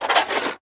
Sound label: Weather Snow Shoveling Snow On Sidewalk Skip Short; AdobeCC